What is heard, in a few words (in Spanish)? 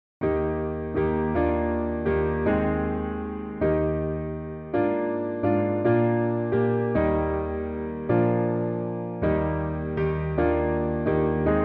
santo piano; santo de schuert; funerales